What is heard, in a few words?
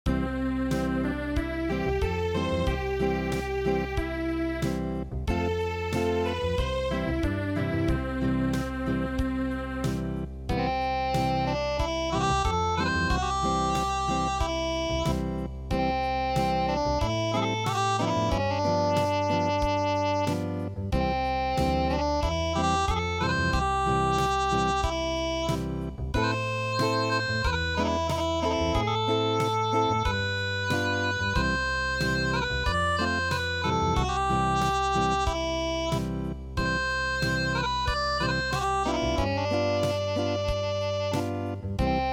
Acoustic